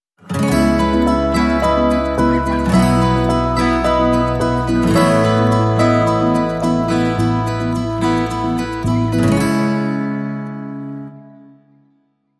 Bright Acoustic Days

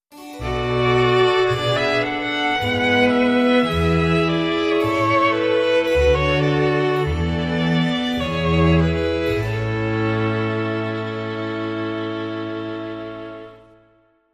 Strings Sting

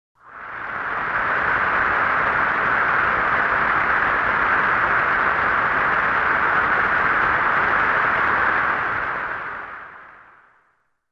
Short Applause

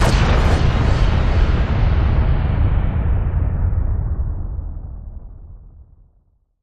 Big Boomer Impact

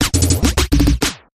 Sputter Shots

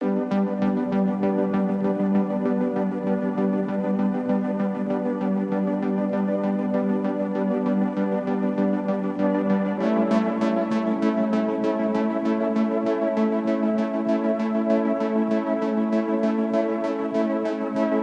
Underscore

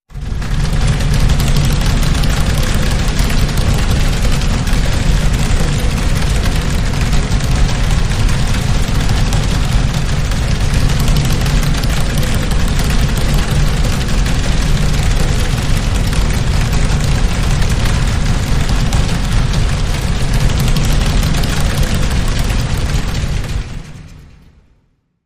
Fan Rumble Atmosphere

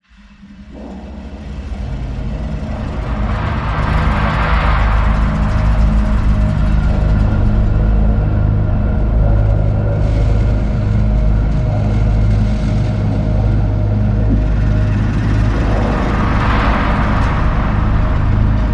Hazard Atmo; Scavengers

